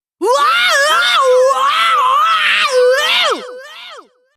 yell

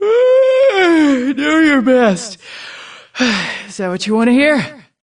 yawn

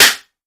clap dry; nahyuta

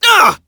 heavy pain; sonic; vc roger

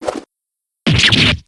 shank; tgaajurors; shiv

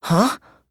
huh

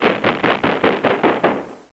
scary thudding

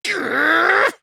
grrr; monokid

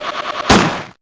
pop; gustavia

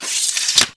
katana sheathe

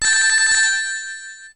hurt; sonic heroes

